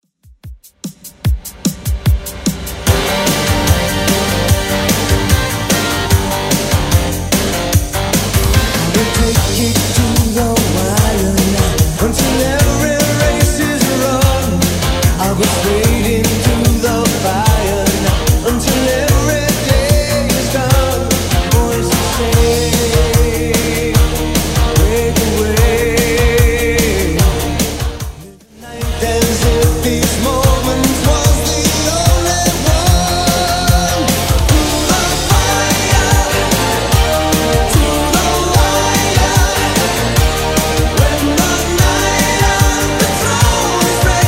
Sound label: 80s Redrum 148bpm